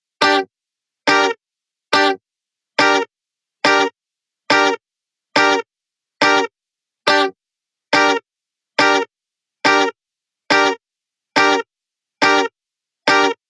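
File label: 140BPM G minor Guitar DRY, Offbeat Dub Guitars; Antidote Advent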